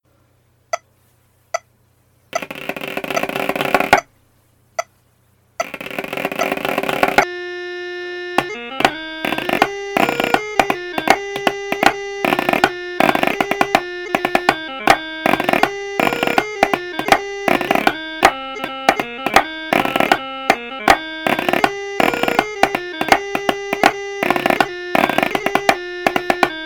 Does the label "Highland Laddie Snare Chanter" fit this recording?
Yes